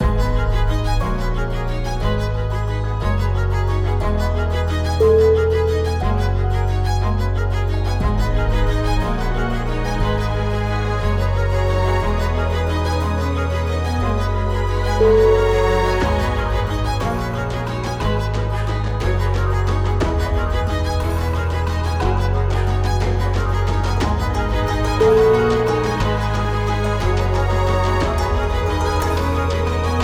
asian life

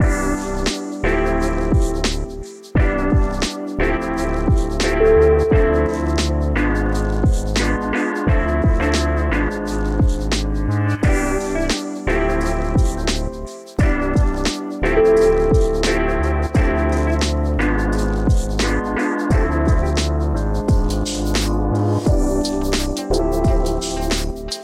lofi

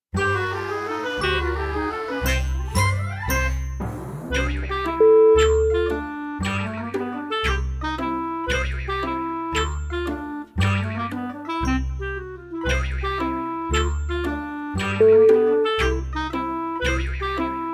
cartoon mood

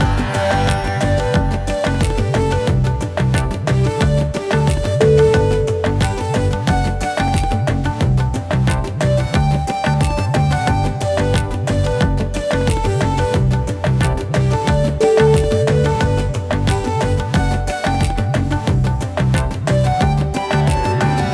namaste bollywood

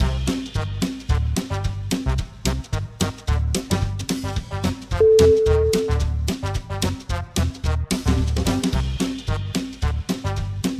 funny parody

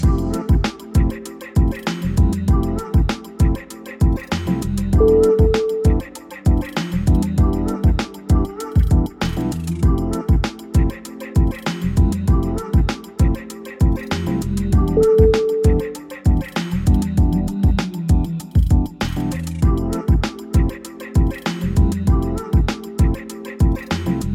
mellow vibes

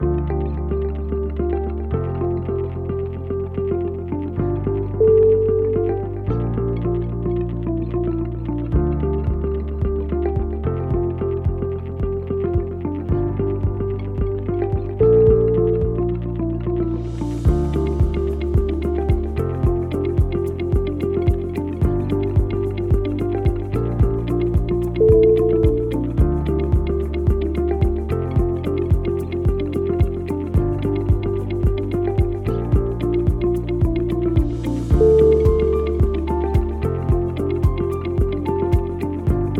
ambient aspire

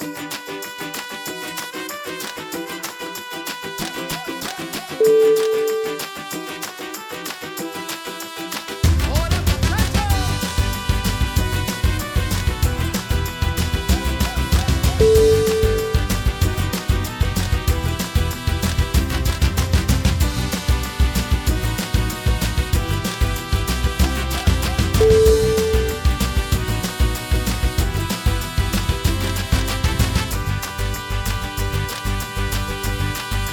mexican mariachi fiesta

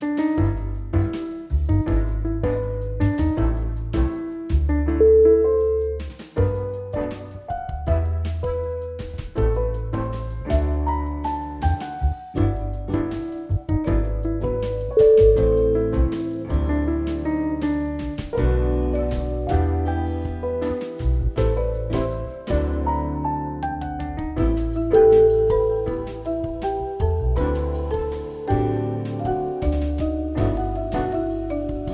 jazz

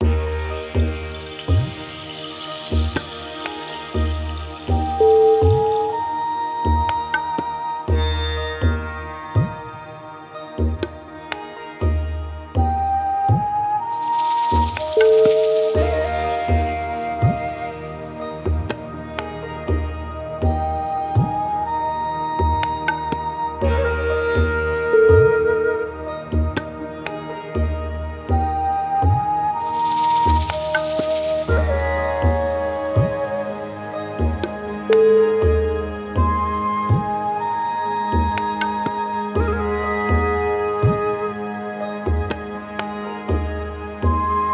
tibetan mandala

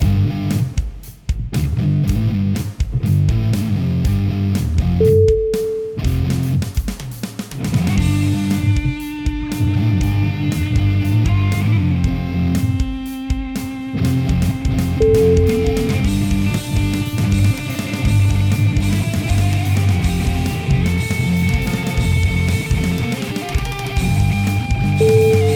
heavy psychedelic rock

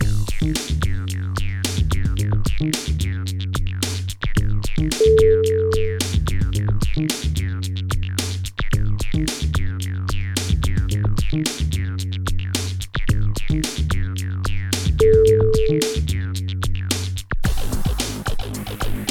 industrial progress

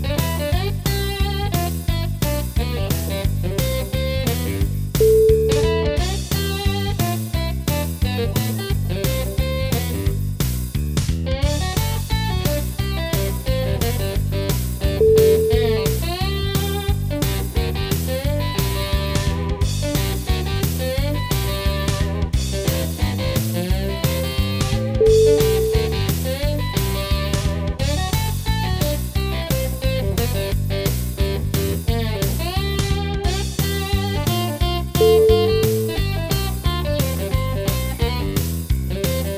swinging booty blues

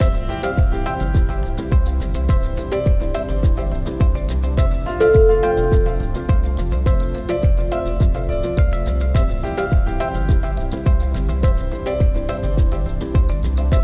techno progress

